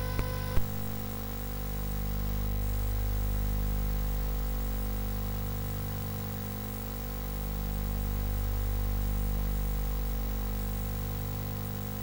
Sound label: Funky Cold Bayernpower, CSG8580R5